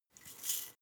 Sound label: herbs tilt fast; soundpool